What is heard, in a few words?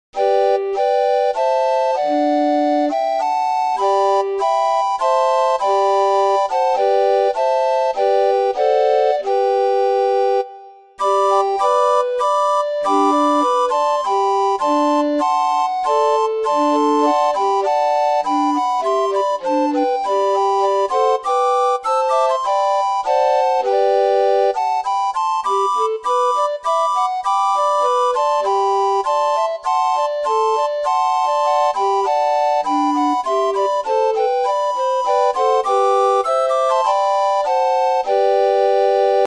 god save the queen, orgue barbarie